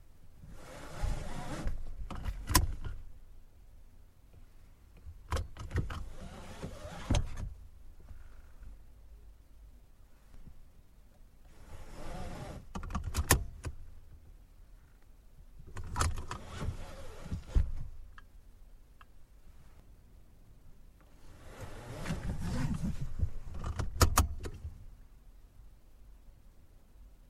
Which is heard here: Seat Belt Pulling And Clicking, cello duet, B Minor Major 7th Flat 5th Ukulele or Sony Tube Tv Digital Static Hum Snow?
Seat Belt Pulling And Clicking